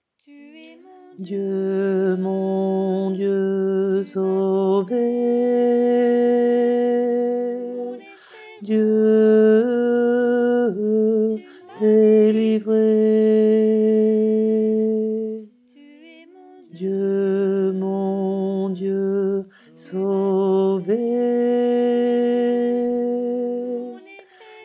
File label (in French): tu es mon dieu tenor